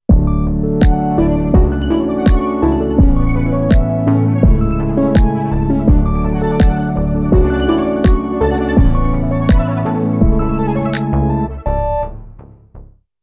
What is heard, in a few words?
Bed and Bells